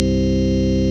CLICKY C1, clicky organ

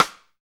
SD, KIT Real-Kit; 90 s Sample CDs; Sound & Vision - Gigapack I CD 1 (Roland); Real-Kit m 5

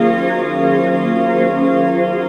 Pad, Jungle; Feenin; Pads

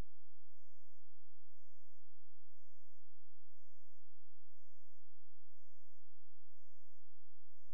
C#, Synclav FM; Big Fish Audio; SPACE1

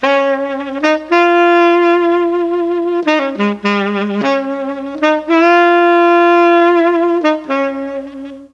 F3 SAX, Mellotron; Mike Pinder - Mellotron Archive CD; FOXTROT+SAX

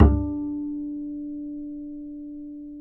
D 4, Bass; Spectrasonics; Bass Legends

